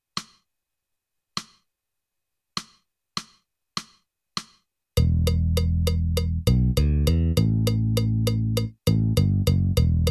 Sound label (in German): Achtel Metronom